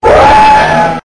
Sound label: roar; blanka